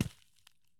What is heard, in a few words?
soil run; Escape from Tarkov